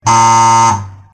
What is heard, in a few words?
wrong buzz